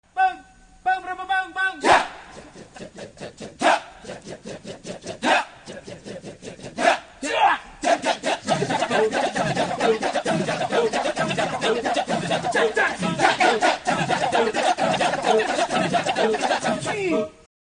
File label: kecak